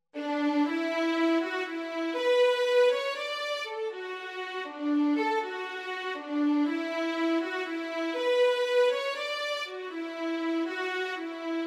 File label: Cello; Aran Boat Song